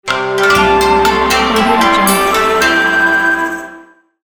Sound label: asia logo